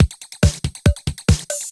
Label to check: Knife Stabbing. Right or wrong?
Wrong